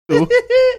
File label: cute laugh